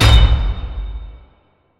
fs 3 X land add; netent

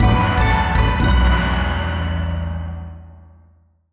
win Medium Legacy; netent

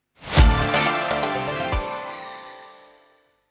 Free Spins Outro; fruitblaze; netent

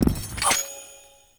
police wild attention

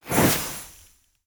wild spread; netent

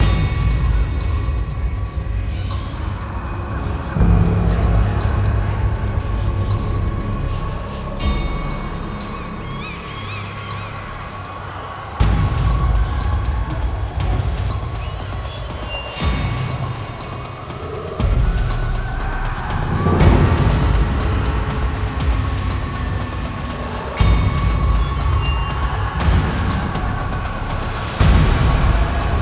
free spin music

